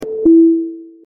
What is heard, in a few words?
disconnect